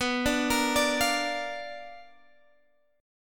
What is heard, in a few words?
B Minor Major 7th Flat 5th, chord